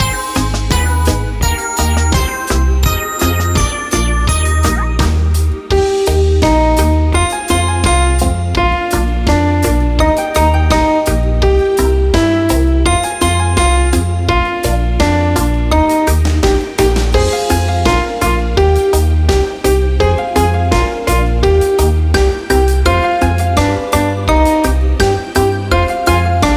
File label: karaoke